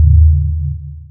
magnetize pulse; weapons; dota; earth spirit

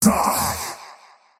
attack pain; dota; faceless void

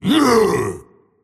pain; dota; tiny

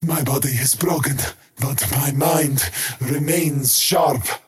skywrath crown cast pain; skywrath mage

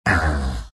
pain, vo; arc warden

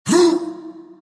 arc pain; Zuus

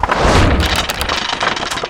engine attack; weapons; dota; creep

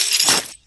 chains, movement; lifestealer

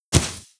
arrow split; dota; medusa

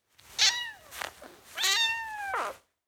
kitteh bye; weapons; dota; juggernaut

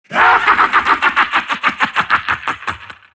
silt laugh; dota; siltbreaker

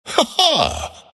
laugh; dota; ember spirit

